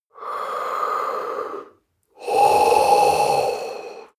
breath male hiss

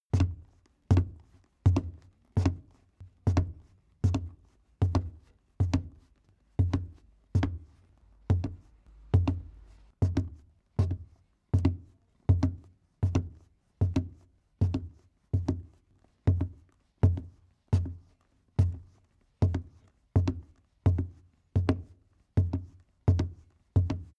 step wood walk

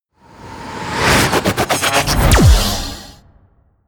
promo pad

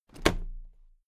toolbox close drop lid heavy

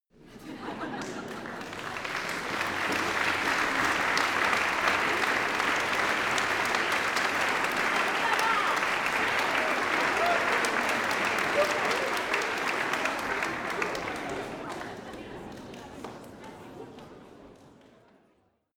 applause medium audience church front

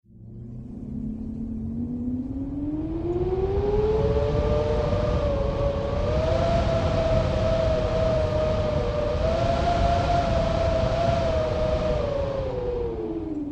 wind howling

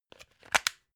plug unplug clatter

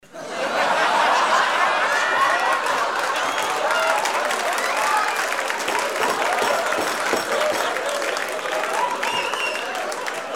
laugh applause table

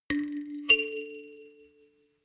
notification kalimba rattle fifth up

